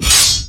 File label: multiline win; In The Forest; Wazdan; claws